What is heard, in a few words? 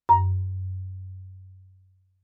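Marimba yarn fortissimo Gb2; MIS Pitches - 2014; Percussion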